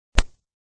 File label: High quality stereo recording of a golf iron shot.; Sports